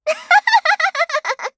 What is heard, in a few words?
laugh; Eternal Return; Garnet; KOR